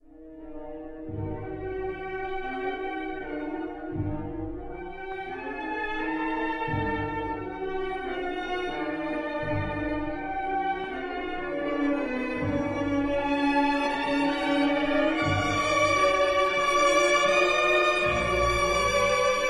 pour orchestre a cordes

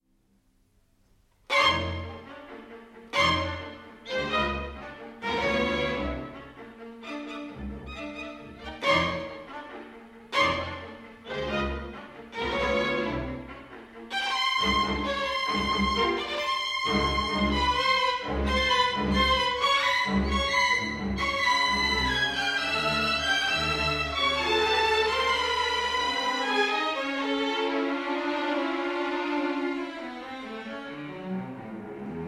Ouverture de concert pour orchestre a cordes; RTBF

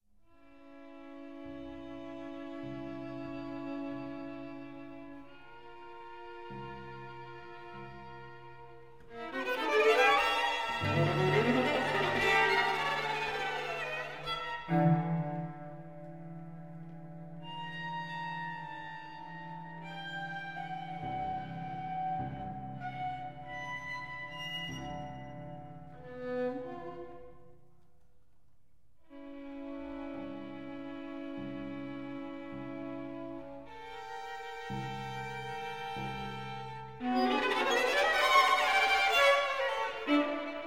spleen pour quatuor et cordes

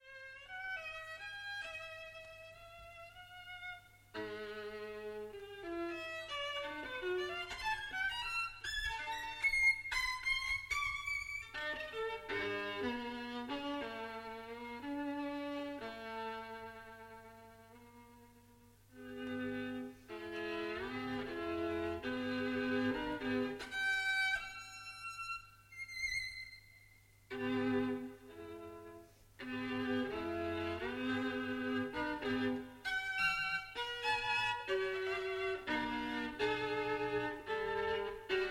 Incantation pour violon et orchestre symphonique; RTBF

